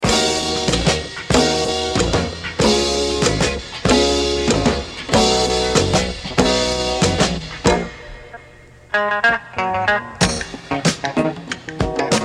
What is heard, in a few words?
afrobeat blues